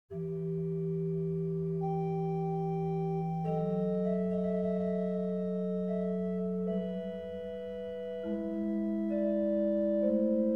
Organ Concerto in G minor - Adagio; Op.4 No.1; Handel Masterworks; Klassika

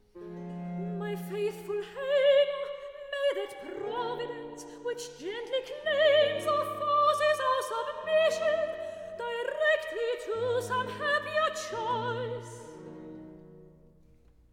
Recitative; (Iphis) My faithful Hamor; Handel Masterworks; Klassika

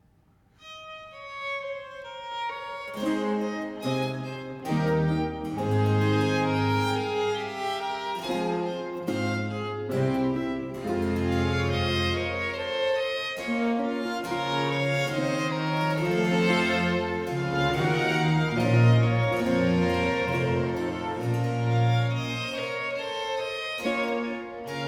Concerto 5 in B flat major 2. Adagio; Arcangelo Corelli; Corelli - Complete Works; Klassika

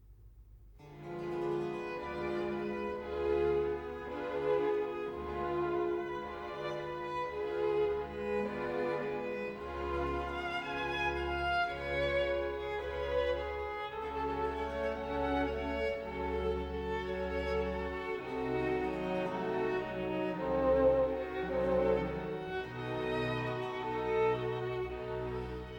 Concerto Grosso in B minor, Largo; Handel Masterworks; Klassika

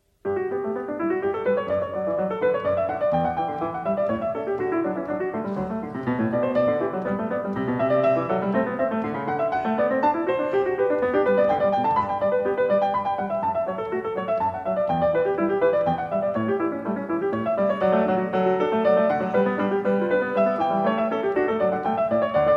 Bach Goldberg Variations

